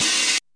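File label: thick bad open hi-hat; Hats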